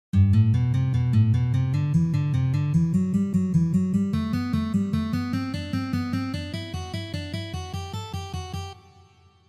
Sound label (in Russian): Uprazhnenie dlya igry gammy Mi minor